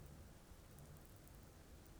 pulses, BALAENOPTERA; MONACO 2022-07

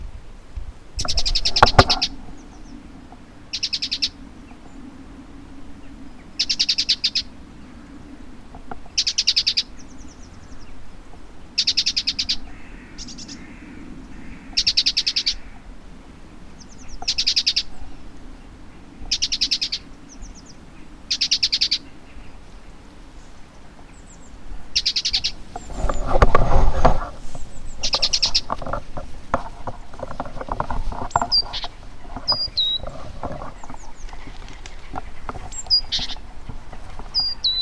Parus major; SETE

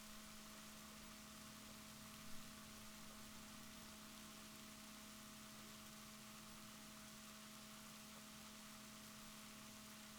rorquals negatif, Balaenoptera Physalus Chile

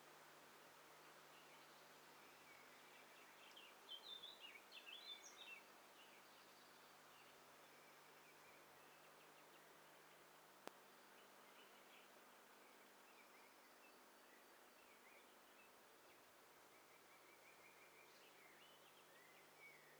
STEREO BIRD COCKTAIL PARTY

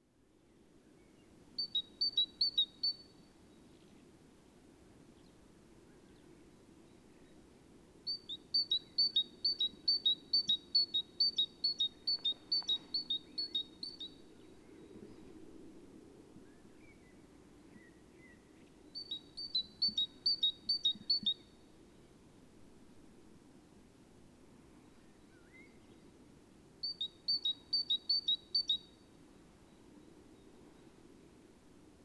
Parus major; SETE

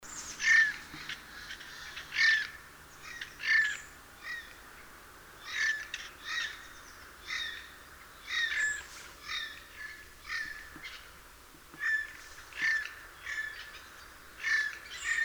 Garrulus glandarius; SETE

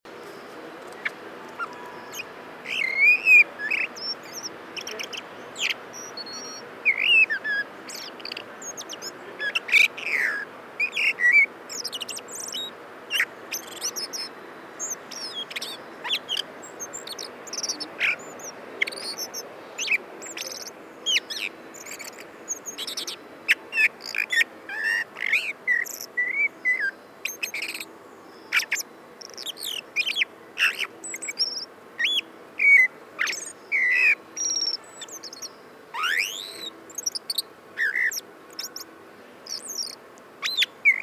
Turdus merula; SETE

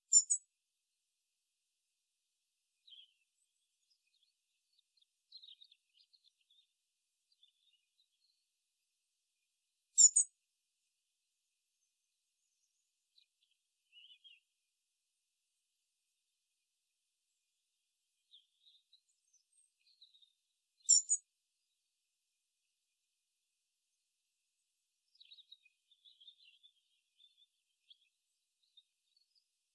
Erithacus rubecula; SETE

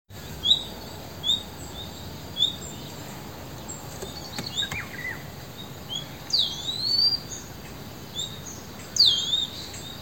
Luscinia megarhynchos; SETE

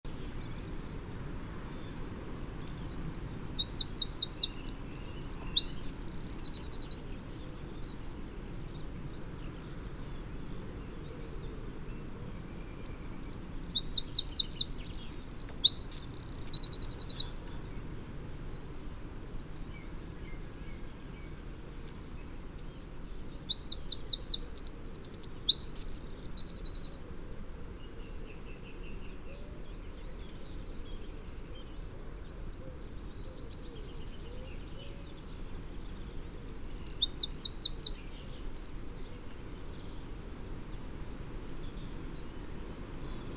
Troglodytes troglodytes; SETE

